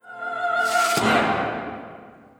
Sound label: guillotine sound